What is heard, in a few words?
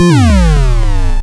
Combo Meter Loss; Super Mario Bros. Remastered